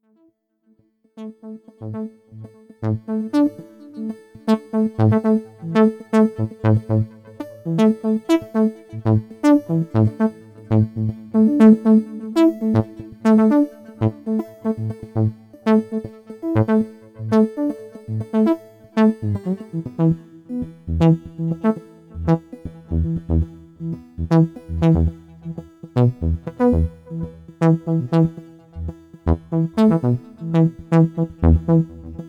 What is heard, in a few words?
Roland JD800 Sequence